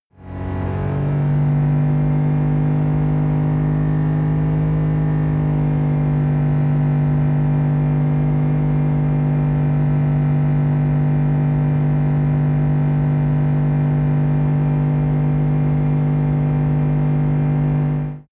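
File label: sports car interior engine drive constant medium fast; verkehr transport; autos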